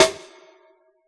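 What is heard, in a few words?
jungle kit snare; Giga Samples Collection; Dr 202 Kit 1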